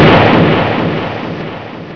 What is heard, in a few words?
Tank Explode; TechnoManiac 1.1